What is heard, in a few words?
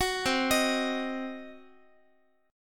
C Diminished C, Guitar 4A,4E,5A,5D,5G sharp,6C sharp; arpeggiated; JGuitar